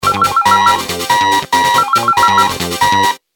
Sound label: Tone, ringtones